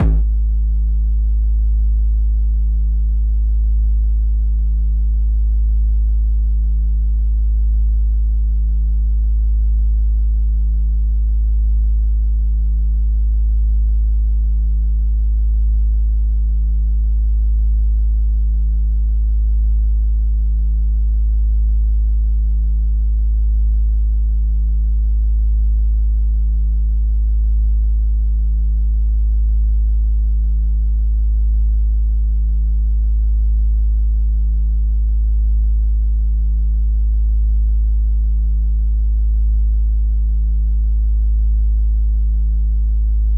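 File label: bass drum Jungle Long, Yamaha DJX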